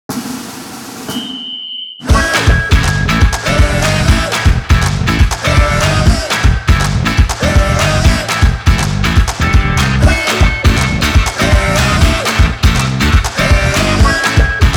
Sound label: Party